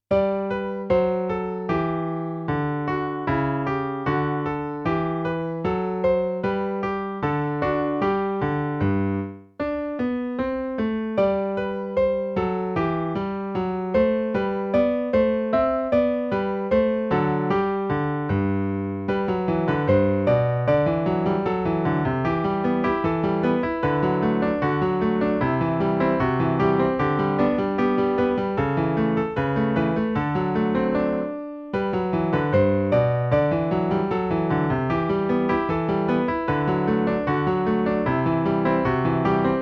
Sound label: Etude, piano; piano favourites